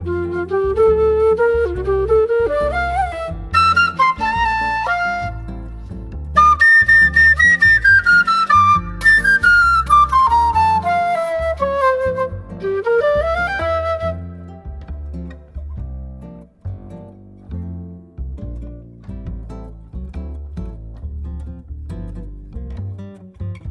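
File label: Classical jazz, Restaurant sounds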